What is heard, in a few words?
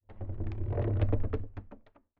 Wood Creak; Fantasy Sounds Bundle; Craft